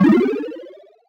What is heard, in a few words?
Note Block Super Jump